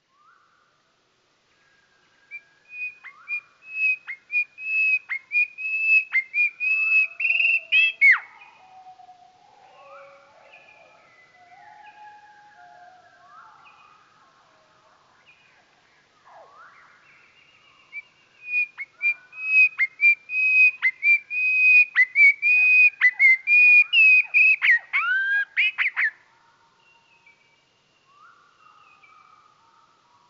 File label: goldcrest song slowed down